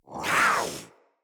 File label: Kavat Vocal Attack Short; Warframes; Khora